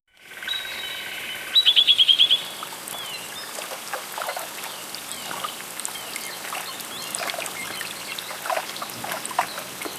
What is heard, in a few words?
the serene natural sounds, middle eastern